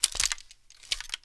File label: m60 open; mg; garrysmod